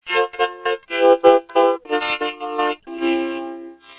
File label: sing, vocoder